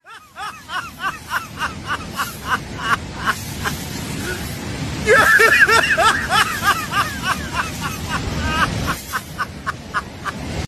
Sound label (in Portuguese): risada malefica kakakak